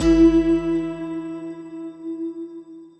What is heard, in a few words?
bom prize normal